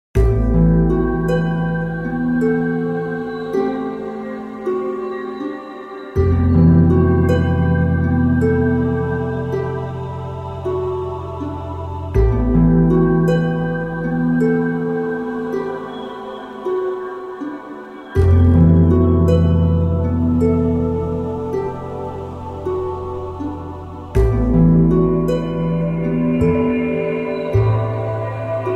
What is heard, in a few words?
save; resident evil 4